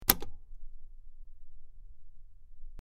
Button Large Button P, switch clicks

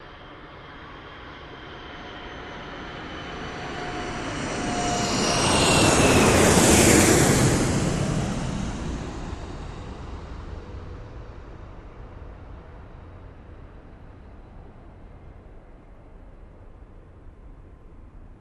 Airport Jet Fly Over

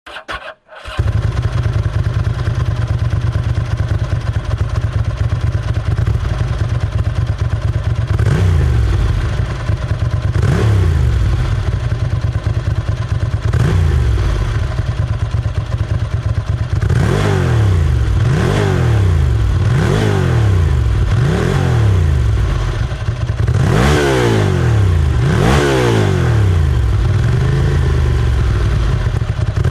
Motorcycle Road Racing

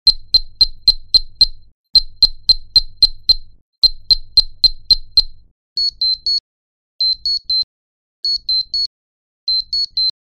Bleeps Small Small El

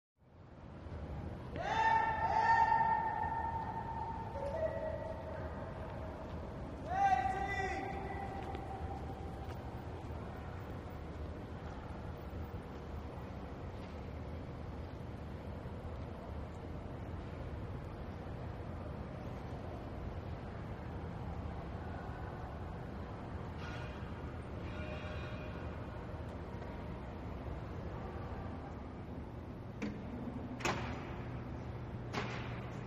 Huge Interior Empty B, lobbys

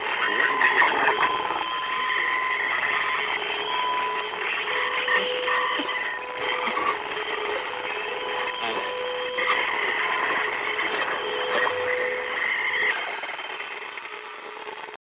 radio cocktail, static

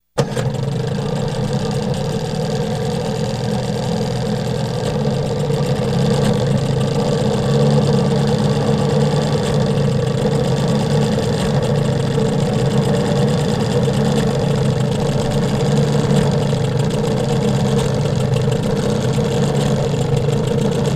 Boat 10 Hp By Idle

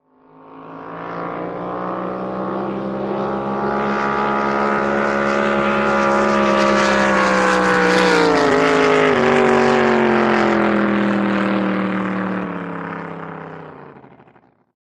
Drag Boat By Cu Fast

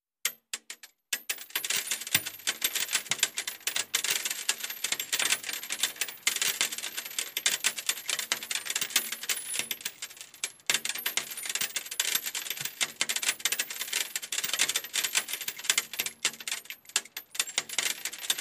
Shell Case Mult Steel, shell casing movement

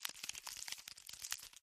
Bug Movement; bugs

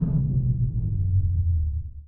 spaceship lift down

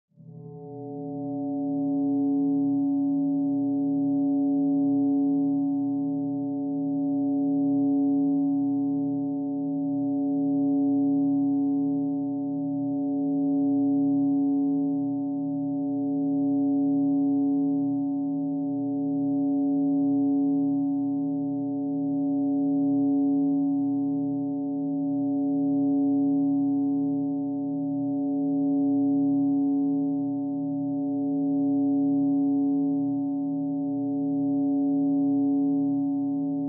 Bell Drone Reverb; Bell Drone Reverb Lr

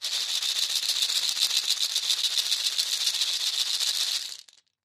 Cabassa Shekere Long, percussion shaker

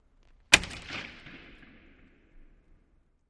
Hockey Player Stick

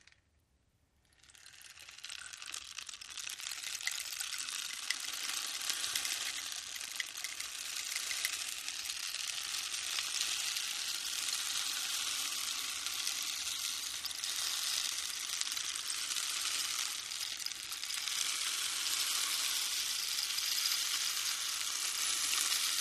Rain Stick Slow, rain stick

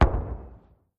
105mm Howitzer Shell

